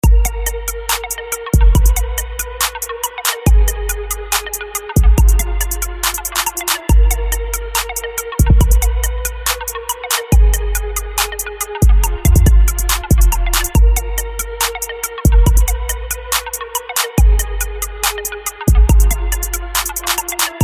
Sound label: Trap